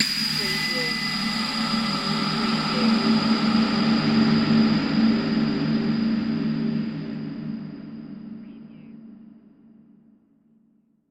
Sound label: Hit Metal